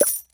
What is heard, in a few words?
item sold